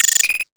new objective

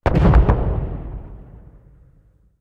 tank explosion far; explosions; War Thunder; tanks

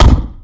tank hit; War Thunder; sfx tanks

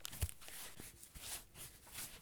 bandage use; Escape from Tarkov

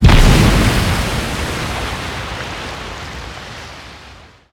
damage hits explosions

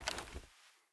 water bottle deploy; Rust

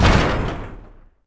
Tank Hit Short; Civ4 warlords

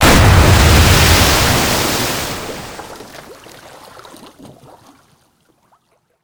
Explosions Explode Water; DCS

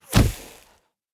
bullet impact sand; Rust

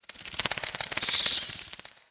bat; World of Tanks Blitz; karelia halloween

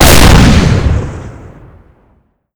detpack main; Explosions; Call of Duty Modern Warfare

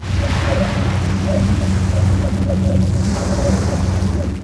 damage hits explosions, Warships

